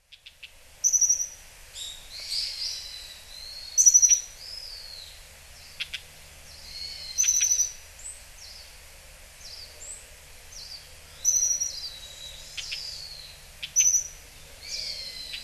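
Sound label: Japanese Accentor; kayakuguri; hyogo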